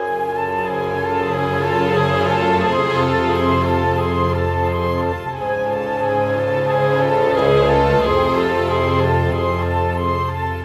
Cinematic Orchestra

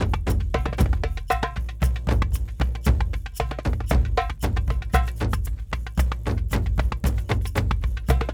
Kendong, World Percussion; Brekete, Shaker, Dumbek

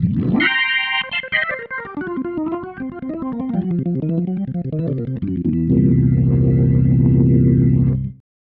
Ending, Organ

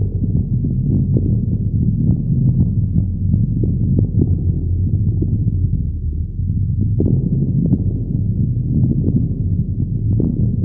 very low, very low drones; Zero-G Ambient 1

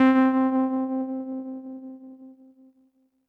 Synth Prophet One Shots, Keys C; Electronisounds

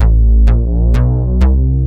BAS, 2 Step

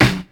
Loop Snare, Ensoniq ASR-X Pro

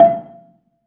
BELA E4, BELAFON, Asian Traditions; USB Soundscan vol.60; AKAI

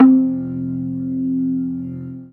Single Electric Basses, Dance Floor Bass

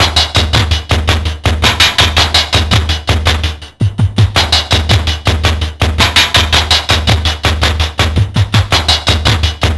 TRIPHOP, GRV110; INIS; Funky Xtreams

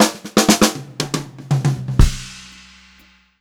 FUNKY FILL, Funk-Groove Drumloops; USB Soundscan vol.38; AKAI; 90 s Sample CDs; 120 FUNKY

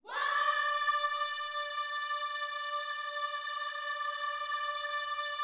WHOA D5, gospel; Spectrasonics Vocal Planet

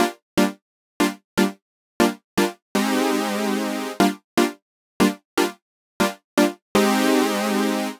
Chord Synth Cm, 120bpm; Zero-G; Extra Chord Progs

